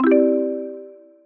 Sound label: sound chapter clear